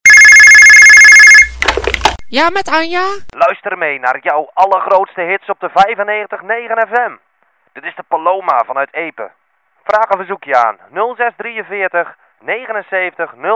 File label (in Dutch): Jingle; Audio Fragmenten; Arkan Radio 2